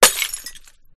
Glass Bottle Break Smash Long Clink; Skyes Audio

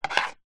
leisure frissbe plastic pick up from wood surface

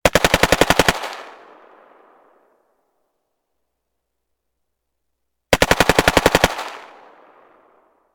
gun ak 47; still north media

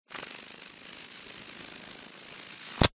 animals lizard gecko eat moth

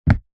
foley footstep single sneaker on plastic walkway

